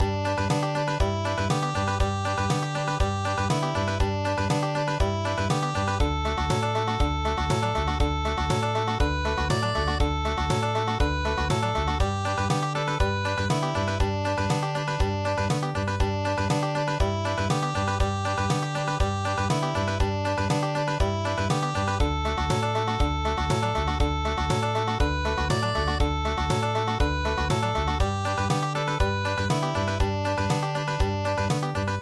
Country, midi; Handsome Molly

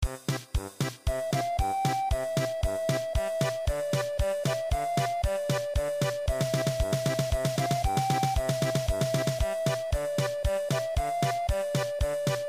Valse Alsacienne German Polka

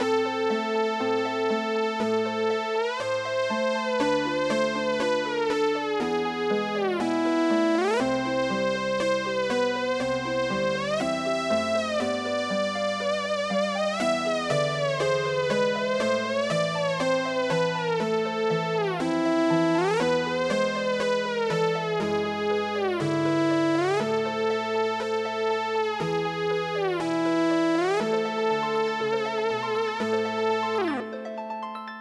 theremin; Dark Eyes

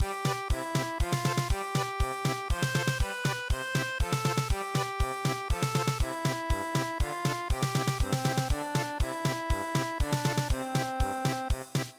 German Polka, traditional

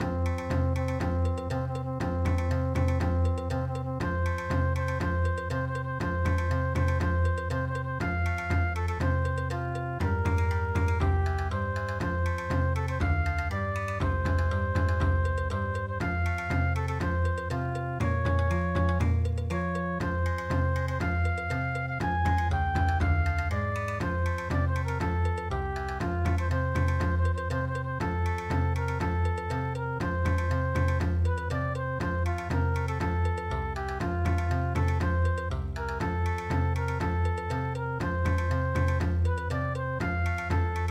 Bon Odori

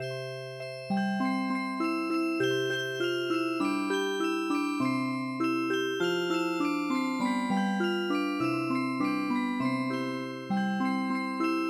ciranda cirandinha Music Box; ブラジル童謡の「ｼﾗﾝﾀﾞ,ｼﾗﾝｼﾞｰﾆｬ(わになろう)」に癒し系のアレンジを施したオルゴール曲; ミディアムテンポな4拍子の中南米の伝統曲です; ＰＣ ＭＵＳＩＣＢＯＸ

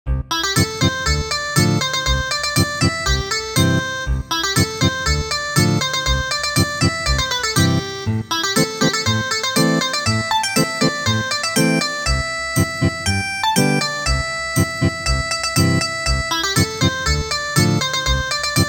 Ethnic Guitar, traditional; Thaleba

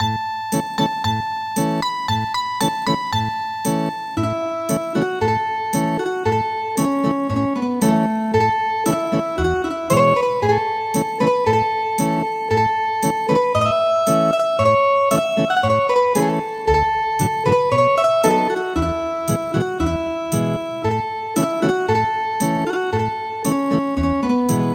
Ethnic Guitar, traditional

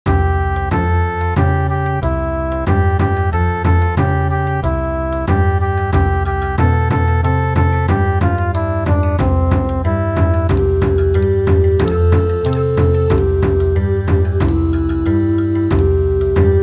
whirling Bon Odori

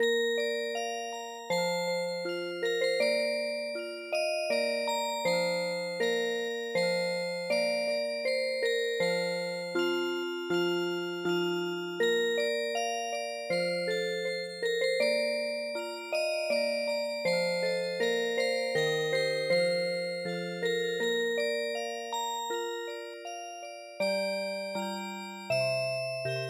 Trumpet Voluntary Music Box

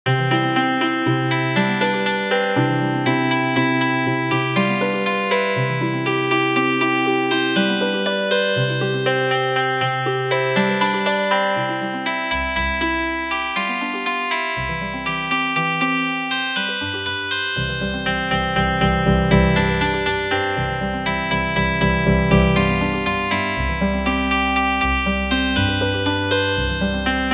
Healin Harp

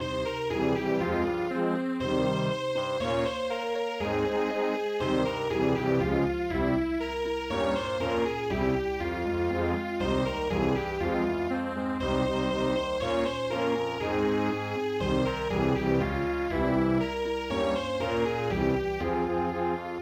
vienna waltz

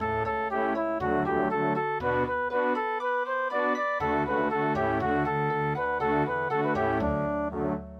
harvests festival song

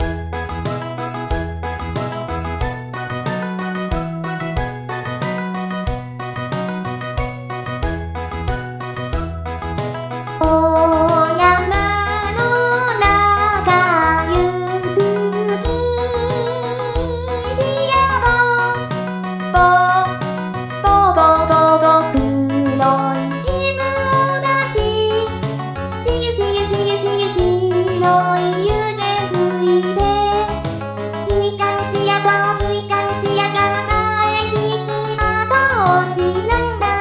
choo choo train, vocal; 鏡音リン

